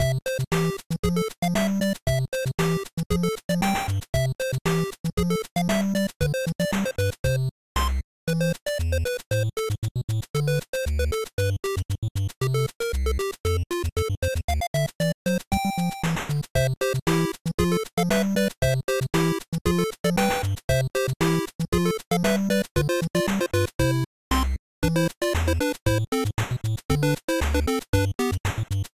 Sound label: platformer, A small repetitive platformer track, it is an experiment in the old hardware style